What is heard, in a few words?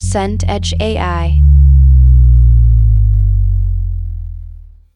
scifi power down long